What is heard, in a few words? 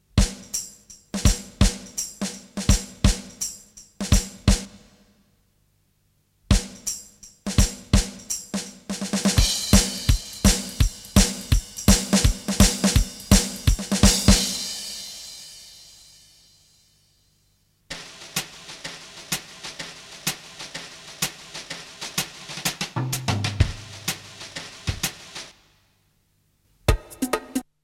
Jingle; Someday Songbook Jingle (Debris) -2023 Mix